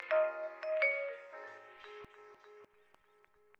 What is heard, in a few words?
Stab; Thirty-One Flavors